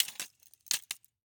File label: Roller skates, old fashioned, all metal, wooden wheels, clash, strike, clatter, ping, slide, scrape; Eiravaein Works; Vaeyan IV